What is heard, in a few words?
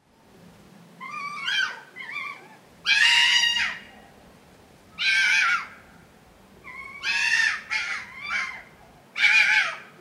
red fox chattering